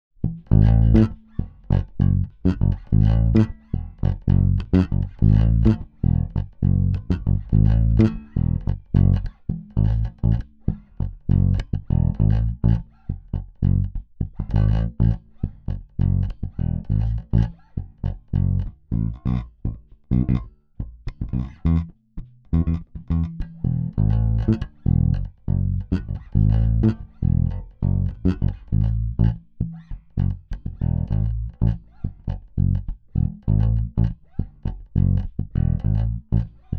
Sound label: BASS samp